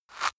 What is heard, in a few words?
rice grind